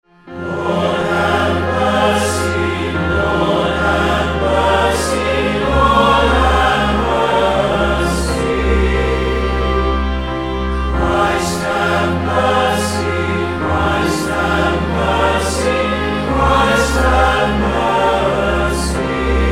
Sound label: Kyrie Eleison Lord Have Mercy